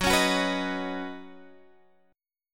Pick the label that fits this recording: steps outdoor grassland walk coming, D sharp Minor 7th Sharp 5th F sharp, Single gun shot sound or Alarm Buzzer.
D sharp Minor 7th Sharp 5th F sharp